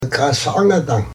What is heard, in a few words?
Quiet laugh